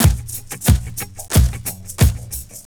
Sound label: 90 VNYL, DRUMS